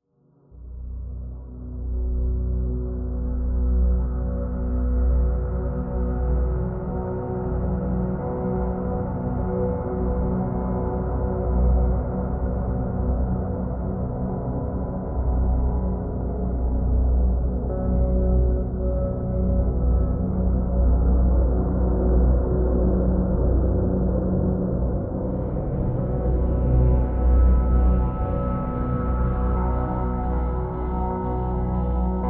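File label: Drn Dimensional B, drone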